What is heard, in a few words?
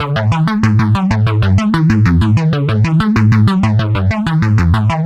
Arp Bass A, 95bpm, analogue circuit; musicradar